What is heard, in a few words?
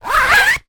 sport badminton racket cover sleeve zipper; zapsplat